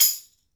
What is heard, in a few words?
TAMB, TAMBOURINE